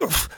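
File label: pain sharp, German; Scout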